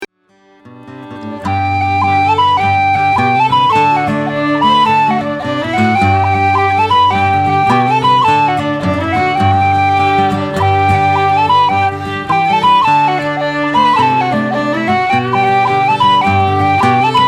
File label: whistle; Chapel Hill